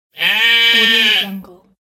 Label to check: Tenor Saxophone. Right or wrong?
Wrong